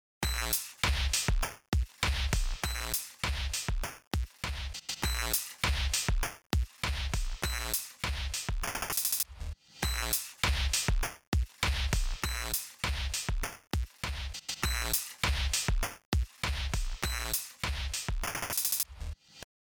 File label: Nano Tech Loop; Rhythmology